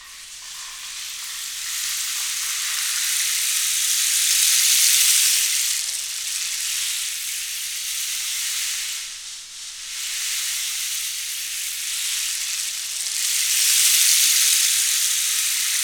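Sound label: percussion rain stick; Roland L-CD701; Water Perc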